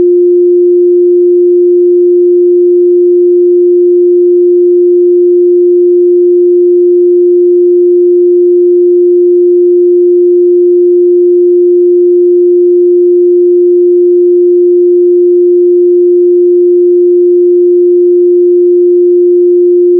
drone 353.3Hz

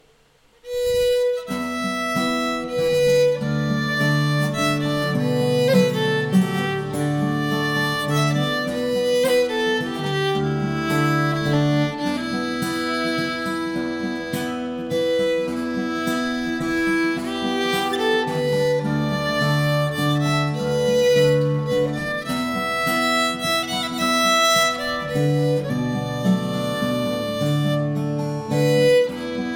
samples playalong

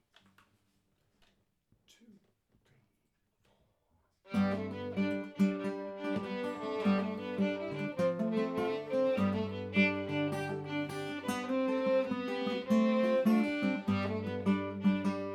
Elsie Marley in G harmony

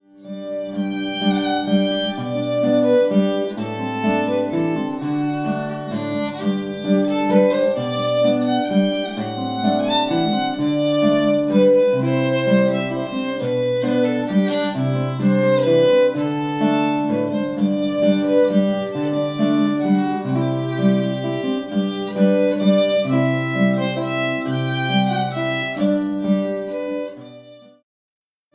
Northumbrian

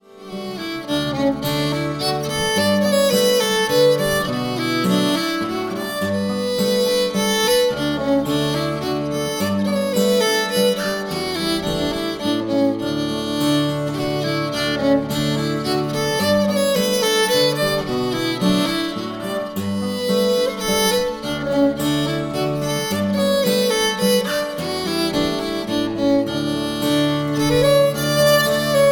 Wuppertal tune

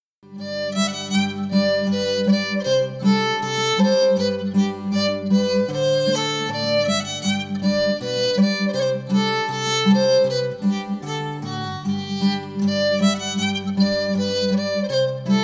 Waunlwyd playalong

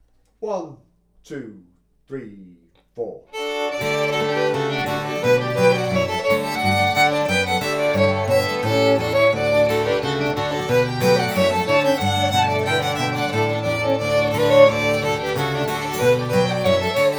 Morpeth Rant full speed

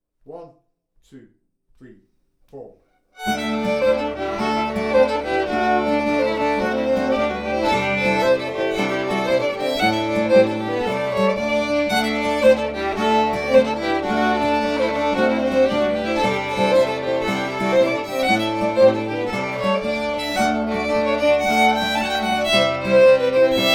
Banish Misfortune full speed

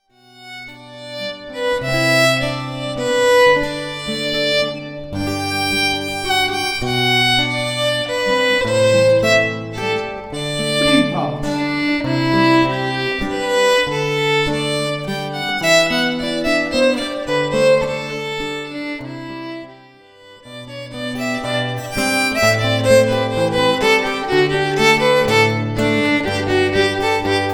Sample Playalong; virtual ensemble

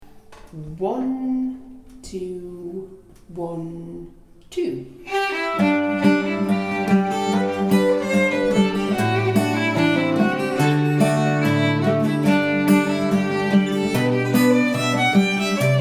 Flowers of Edinburgh Fiddle Tune Guitar

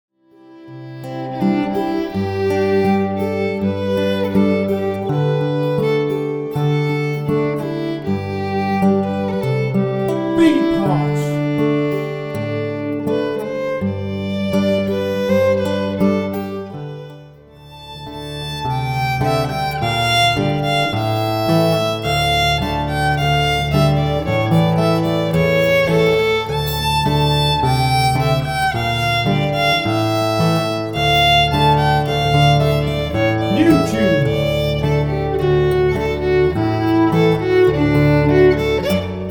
Sample Play along; virtual ensemble

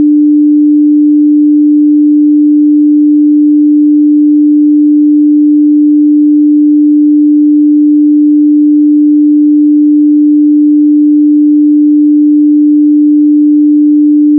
D string

